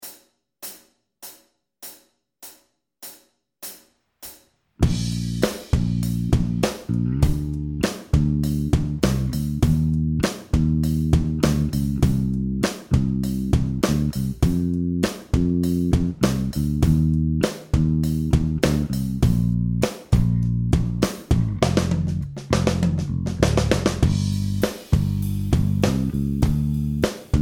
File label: no guitar